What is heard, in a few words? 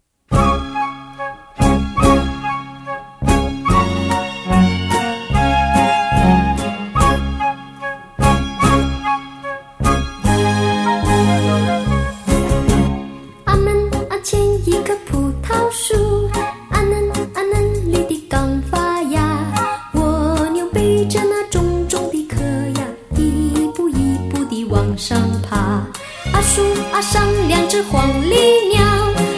chinese snail song